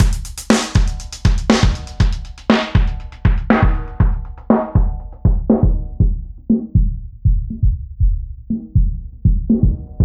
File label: basic sweep with drive; universal audio